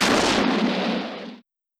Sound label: bullet on; tf2ware ultimate; mariokart